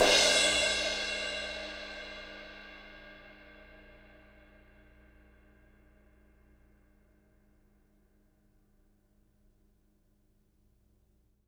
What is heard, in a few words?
Crash Acoustic; Genesis